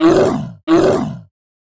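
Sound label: Were Bear Attack; Seer; GamigoNA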